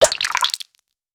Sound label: a toxic soda candy energy, beverage